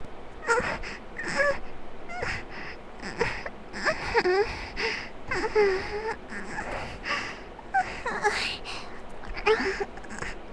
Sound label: Moaning; Vocaroo; Diamond Tiara